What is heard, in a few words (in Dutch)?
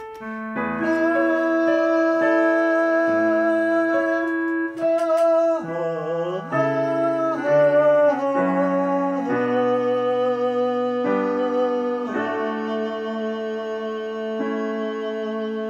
zang